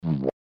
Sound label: PUPUM